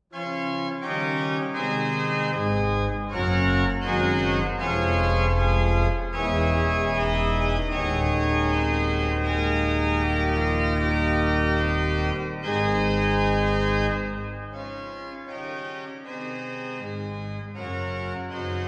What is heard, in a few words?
postlude in g, organist